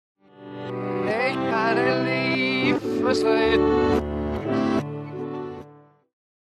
reverse, hard rock

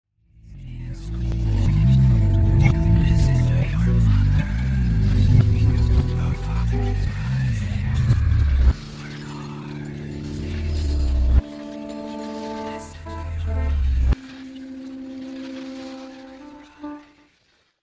intension reverse; backmasking contrario

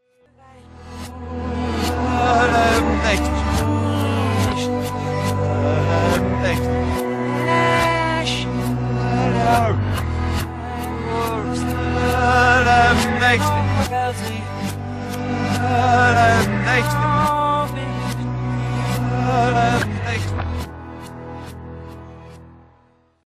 a child is coming reverse; messaggio subliminale; backmasking contrario

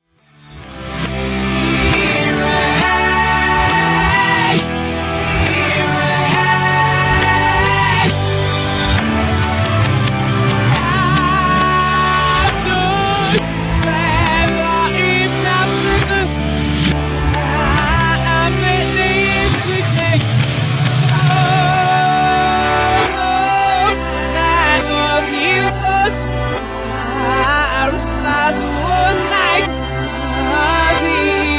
reverse, heavy metal; backmasking contrario

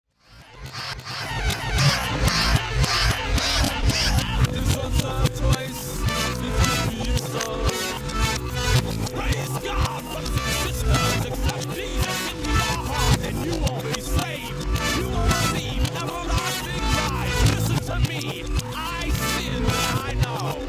reverse; backmasking contrario

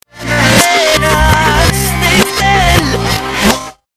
reverse, pop rock; backmasking contrario

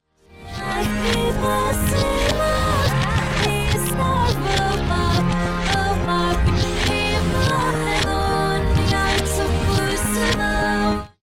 reverse; backmasking contrario

